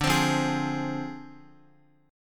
D-Minor 7th Flat 5th, D, Guitar, 4F, 4A, 5C, 5G, 5C, 6E; strummed; chordshape